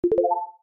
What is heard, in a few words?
ping